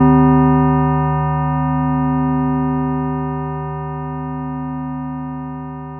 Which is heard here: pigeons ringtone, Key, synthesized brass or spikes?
synthesized brass